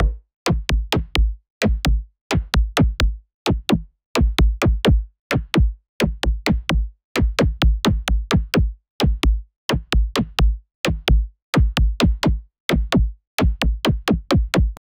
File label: synthesizer melody and 44